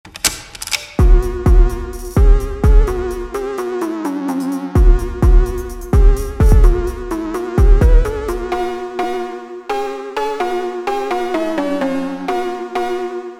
Phonk Drift Edition feat Phonk Remix Slowed Music slowed Music Remix, Drift Phonk